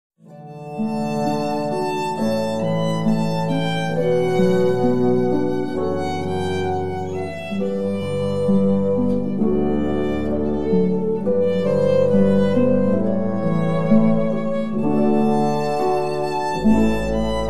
Canon

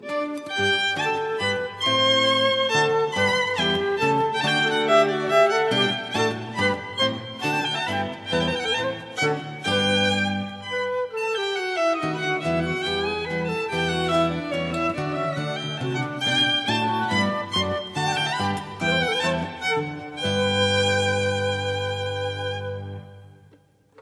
Minuetto

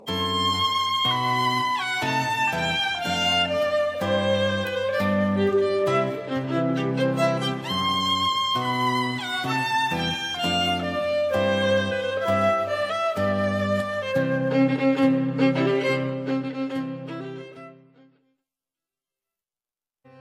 Wedding March

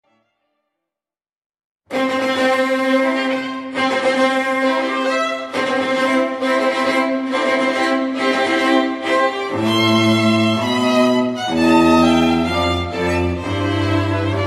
Wedding March

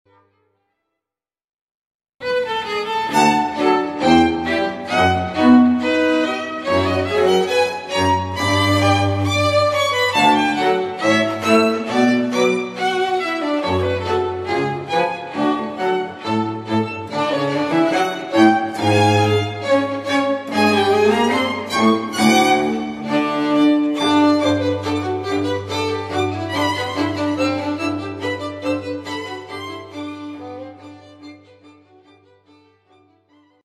Gavotte

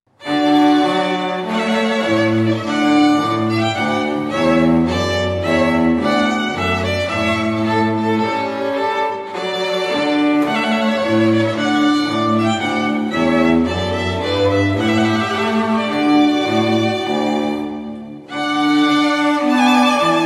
Trumpet Voluntary

